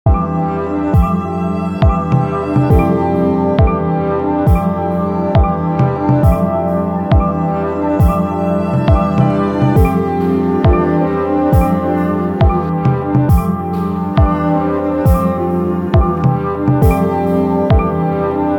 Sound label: guitar